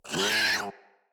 Kavat Vocal Pain; Warframes; Khora

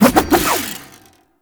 Staff Spin Swing; Warframe; Duviri; Dax; Weapons

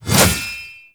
Shield Whoosh; Warframe; Duviri; Dax; Mace And Shield

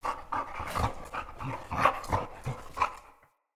Wolf Idle Open Mouth, Animals Wolf Vocals

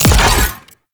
Proj Explosion; Warframe; Sentient; Conduit

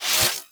Electricity Shock Burst Fire Stop; Warframe; Corpus; Oxium Osprey

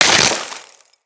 Melee Impact Wood

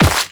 Run Dirt, Footsteps

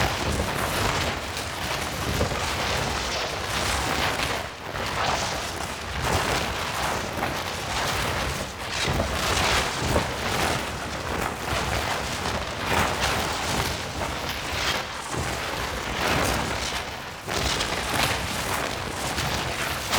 Vendor Buff Menu Hover Left Loop; Tau; Circles Of Hell

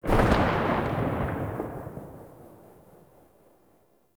Concrete Archgun Charged Tail; Warframe; Archwing

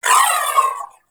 Briefcase Akimbo Pistol Alt Proj Whizzby; Warframe; Corpus

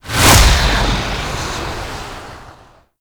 fly by quick, vehicles flight; Warframe; Liset; Stalker Liset Skin

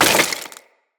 Melee Impact Wood

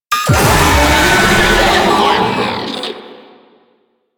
Proto Infested Babau Player Spotted, Vocals

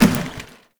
Janitor Cart Impact Short; Warframe; Ambience; NineteenNinetyNine

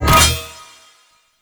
Mech Shield Bash Charge; Warframe; Nechro Mech; Abilities

